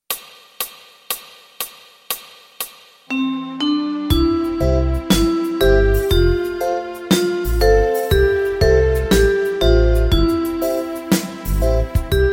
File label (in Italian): Tastiere tutti